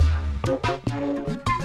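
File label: Music Dpad Base 145; From Dust; PS3; Xbox 360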